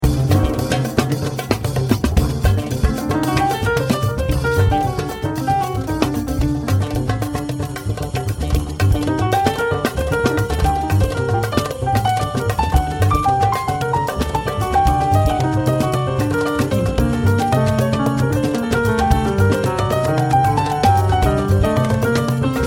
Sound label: Piano